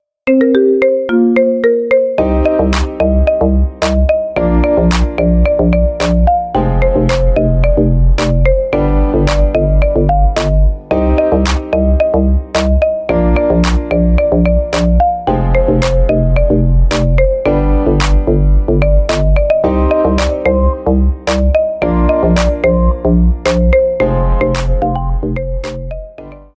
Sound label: minimal marimba remix